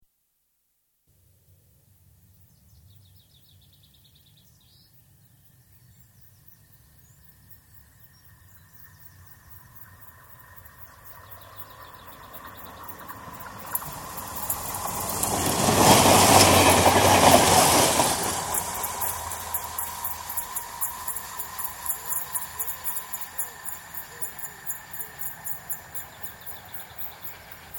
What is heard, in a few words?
Single Diesel Locomotive, pass left to right with rail noise; Train; BBC